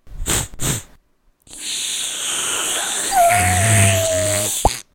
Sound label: Kitten huff